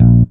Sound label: Synth Bass; Synth Shots; Vengeance Samples